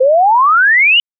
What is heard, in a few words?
Sweep 1s; LaSpezia; CESI